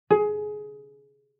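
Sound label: Cello pizz fortissimo sul A Ab4; MIS Pitches - 2014; Strings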